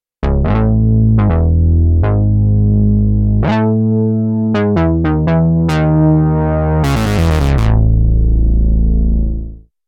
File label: Oddy Bass; KOPRO+